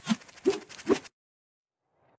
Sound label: Triple Swipe; Caffiend